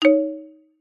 multimedia game sound slot machine single mallet chime